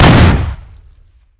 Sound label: loot expl